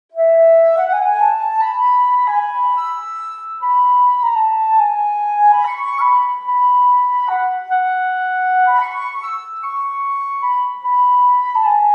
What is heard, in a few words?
Woodwinds; 3 flutes; sampled woodwind instruments; Berlin Woodwinds; Orchestral Tools